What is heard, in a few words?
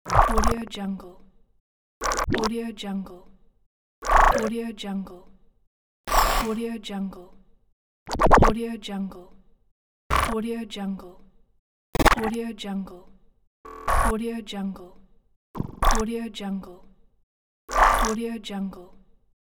futuristic infographic pack